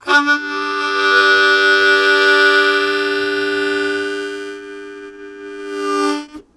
Harm 129bpm G end, Harmonica; Quazibeat - African Secret

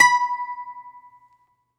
Greek Bazouki, Plucked Strings

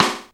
RX5 RIM; Hollywood Edge; The Big Bad Beatmachine

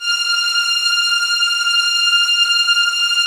TREM F6, Violin; Q Up Arts; Denny Jaeger Master Violin Library; PERFORMANCE

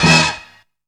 STAB; Zero-G; Funky Elements

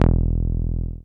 303, TB-303 BASS

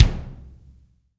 kick, ambient kick; Roland L-CDX-01; KIK Ambient K1

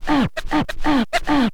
scratch kit, vinyl; Masterbits; Vinyl Attack; scratches

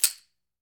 CAXIXI HI, Latin Shakers

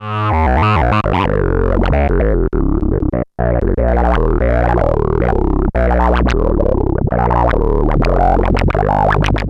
TALKING MIDI, SFX, TECHNO; Syntec; Wall of Sounds; TECHNO-BOX

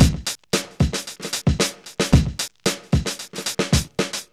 STEAMIN 113, Funky Elements loops; Zero-G

